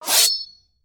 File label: Knife Slash Spike Trap Sharp Sound